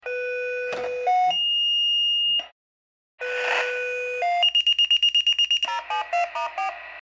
VFD Tone; Lineboro

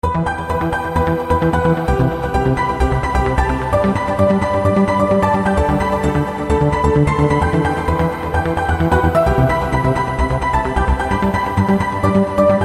E House Piano

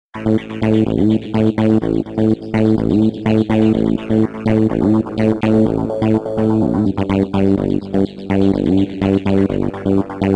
Sequence Song

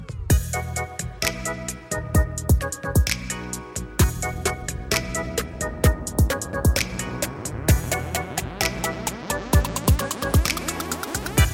Trap Mix

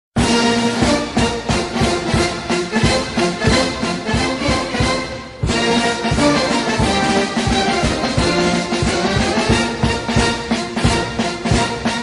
romanian military; ringtones

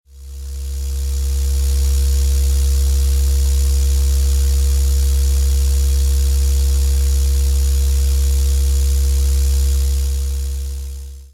Sms Interference

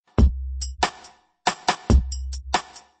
90s Bollywood Melody

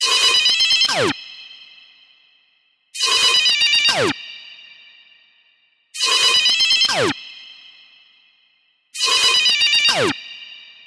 Psycho Sound

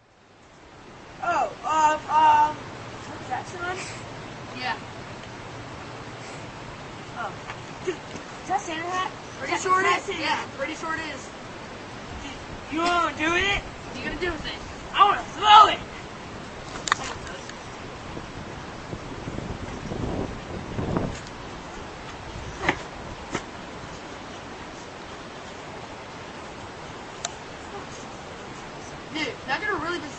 cough sneeze snoth

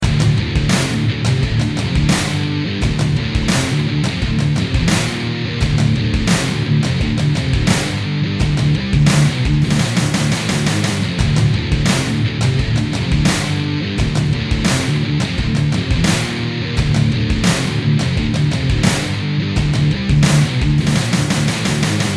rockstar beats

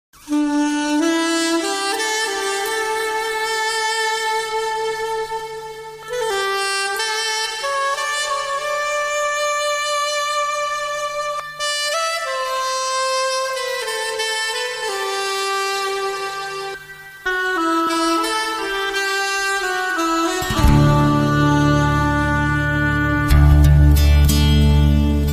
solo the saxophone; classical

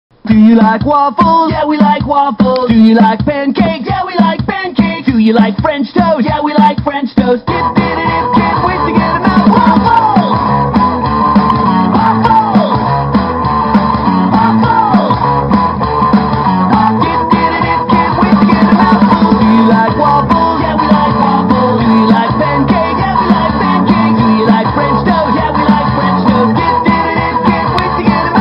Reggae

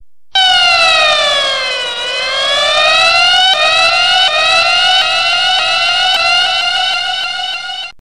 Roller Coaster

